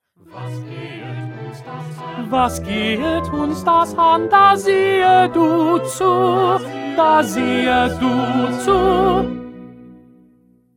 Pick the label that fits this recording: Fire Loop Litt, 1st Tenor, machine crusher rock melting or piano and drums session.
1st Tenor